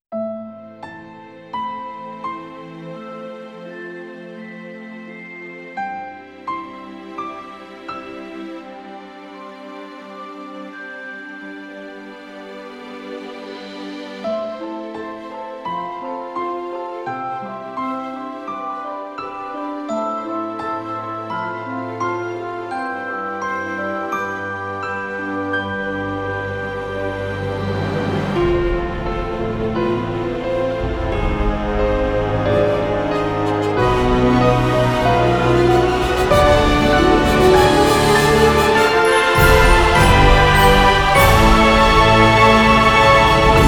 Uplifting; Pitch Porsche

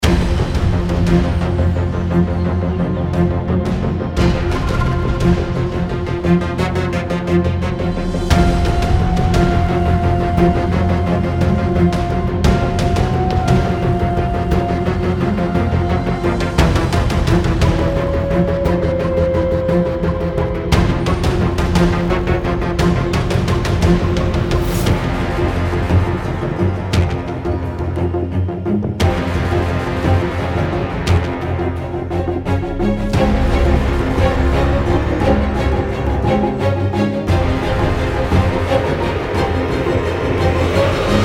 Battle Hybrid

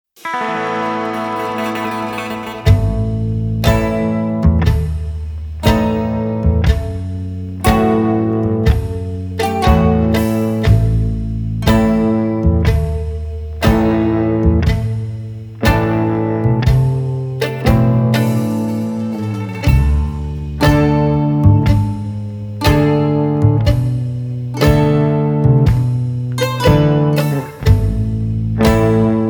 TV Queer Blues Folk LIVE; WDR

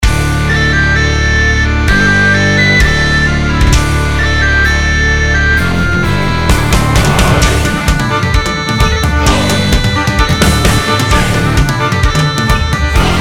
Folk Metal Theme; For Warhalla

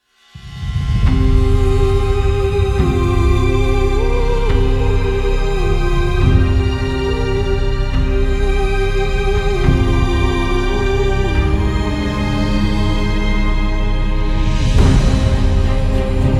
Maintheme Orchestral; Elder Scrolls Legends Houses of Morrowind

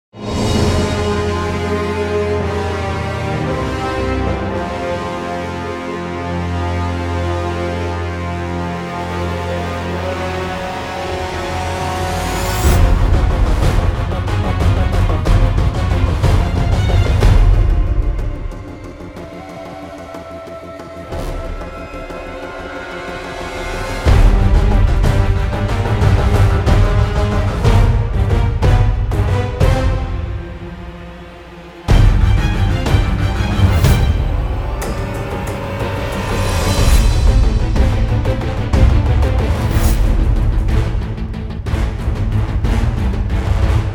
Cutscene Orchestral; Mortal Kombat X

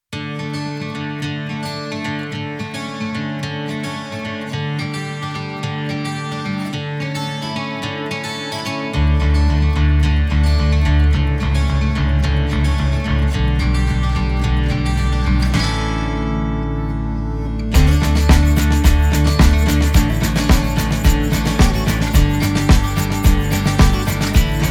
Easy Listening Pop

